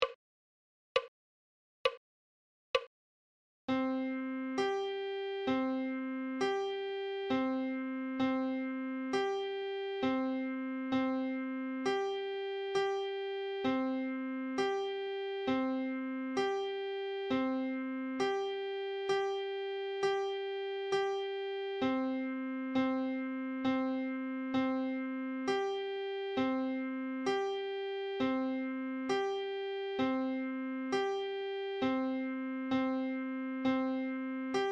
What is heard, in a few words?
treble clef adagio